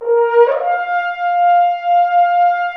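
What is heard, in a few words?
french horns, swells; Roland L-CD702; F.Horns FX+; FHns Swoops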